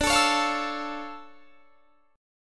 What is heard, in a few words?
D Minor Major 9th D down; DmM9; strummed; JGuitar